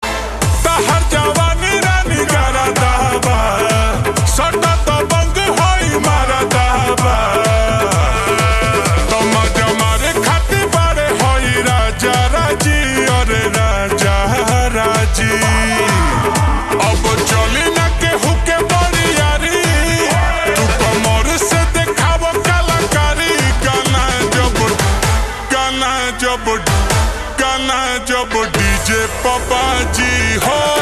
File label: Ringtone slowed and reverb